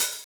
hihat open; Detroit

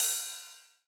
ride, TR-909; Detroit

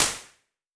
small drum room; IR

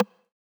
rim, TR-909; Detroit

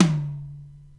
tom, pearl master studio; drums

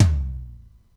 tom, pearl master studio; drums

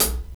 hihat closed; drums; pearl master studio

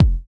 kick short, TR-909; Detroit

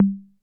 conga, CR-78

